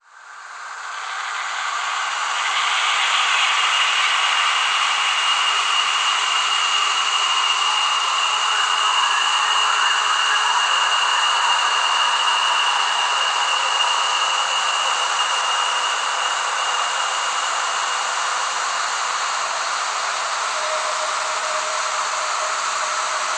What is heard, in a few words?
Atmosphere - 140 BPM - E